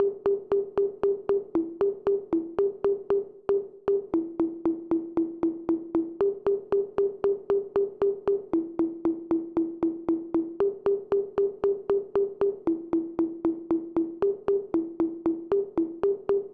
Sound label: drums